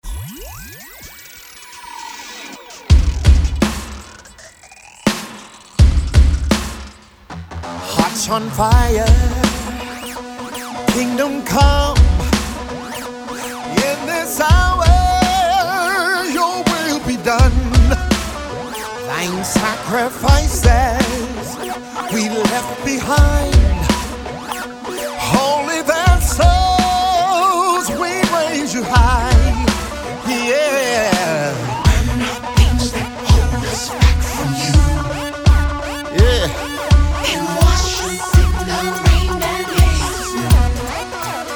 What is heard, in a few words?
Radio Version